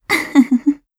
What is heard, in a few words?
comboburst